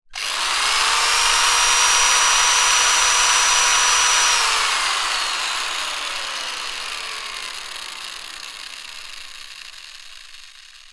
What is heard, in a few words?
industrial tools drill electric med burst freewheeling; sound effects one